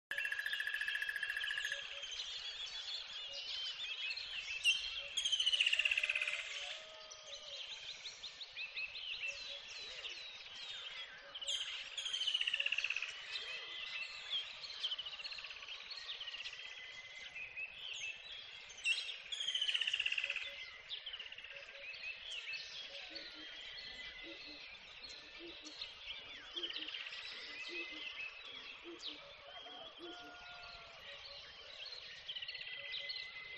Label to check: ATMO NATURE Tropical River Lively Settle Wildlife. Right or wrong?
Right